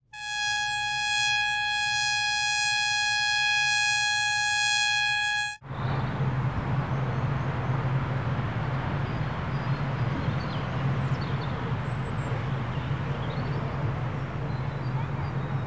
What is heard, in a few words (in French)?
navigation; sainte-foy